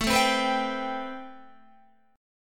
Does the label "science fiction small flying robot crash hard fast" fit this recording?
No